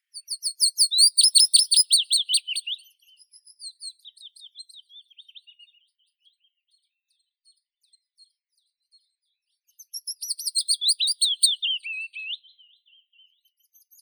penochka vesnichka phylloscopus trochilus

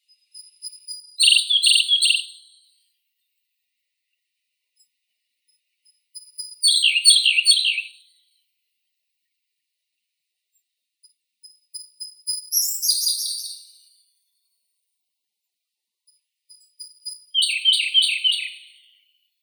zvuki prirodi solovei sinii luscinia cyane

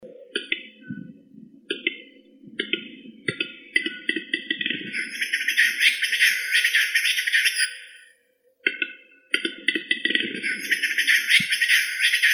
gluhar tetrao urogallus

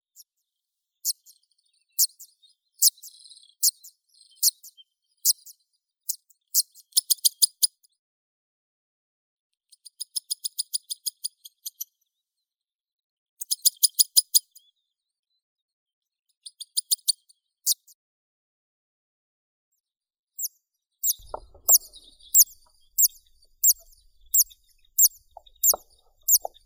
zvuki prirodi tsistikola veerohvostaya cisticola juncidis

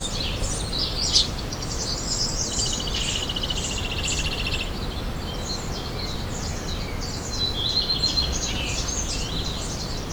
polden v sadu; zvuki prirodi

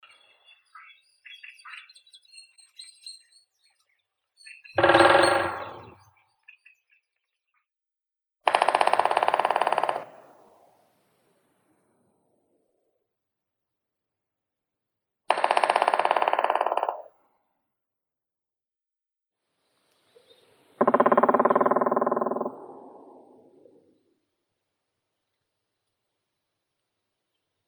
dyatel chernii zhelna dryocopus martius

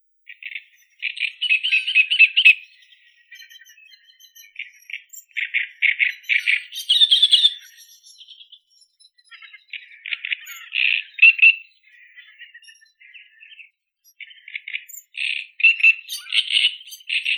kamishovka drozdovidnaya acrocephalus arundinaceus

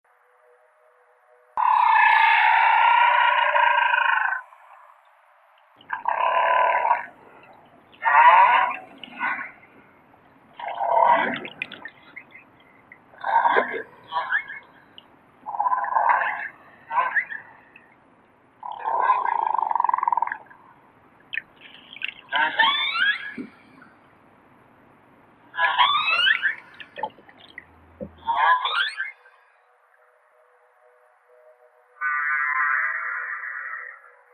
narval ili edinorog monodon monoceros

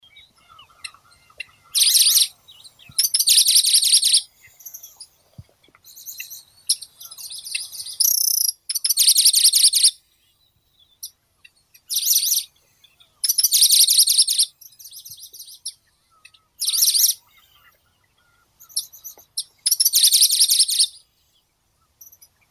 zvuki prirodi sverchok ostrovnoi locustella pleskei

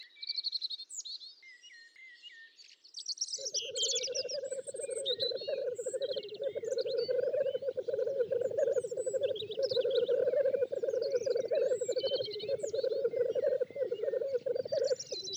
zvuki prirodi teterev

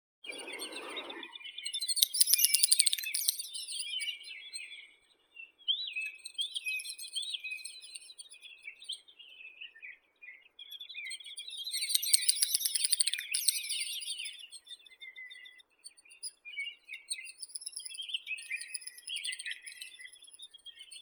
dupel gallinago media

